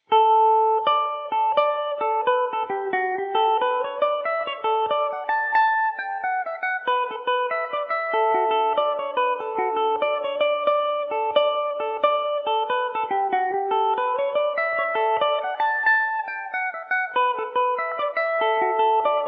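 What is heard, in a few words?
planxty D major revelation, Irish mandolin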